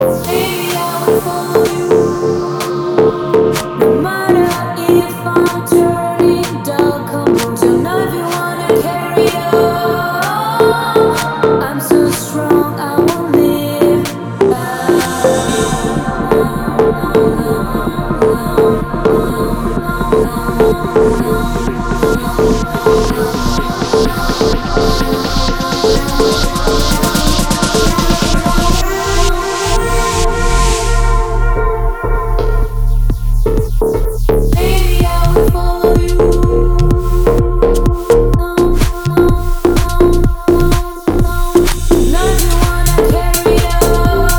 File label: Fall With Me Deep House